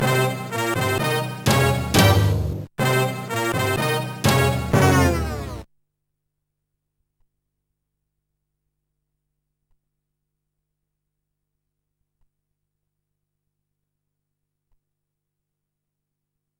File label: jingles; Editeur Musical Sequentiel; OCB